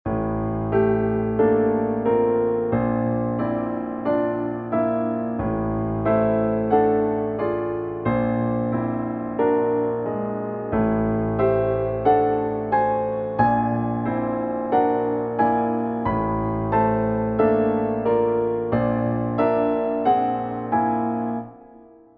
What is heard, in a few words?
wholetone aug6